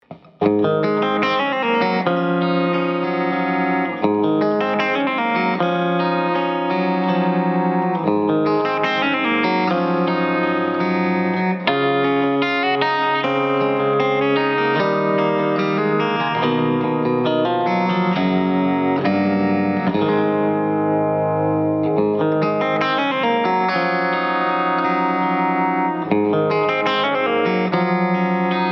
57 Bridge Clean, pickups

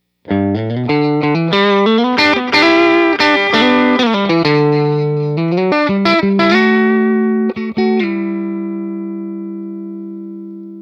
'59 Strat Bridge

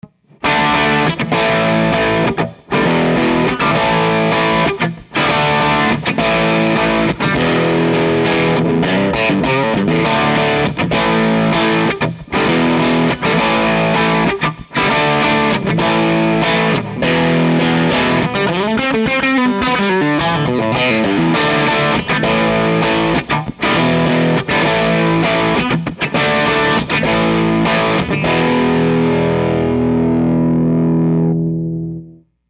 Epic 57 Dirty Bridge, pickups

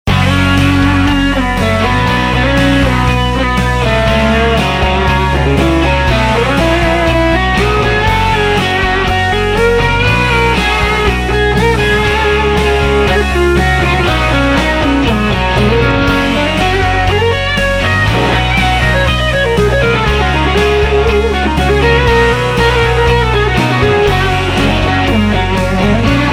61 strat bridge; Klein

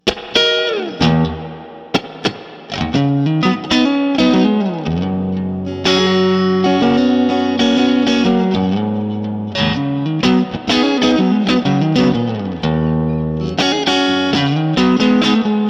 Epic 1955 Neck Super Reverb, pickups; Klein

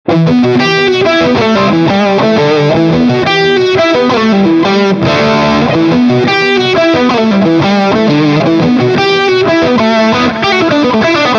S-7 Bridge dirty, pickups

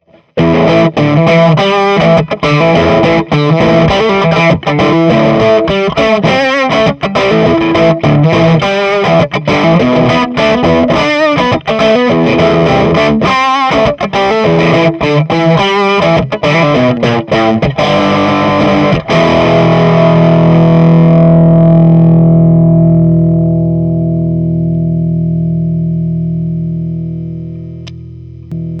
tele bridge overdriven, pickups

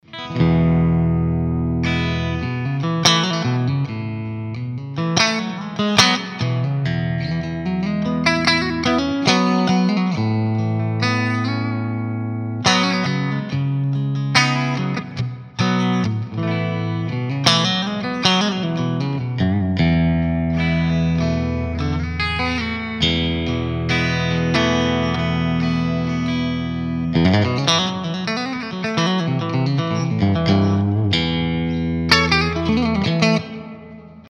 jazzy

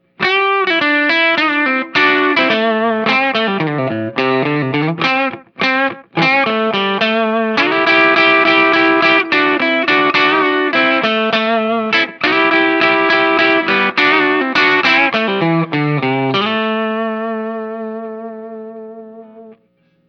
blues clean bridge pu

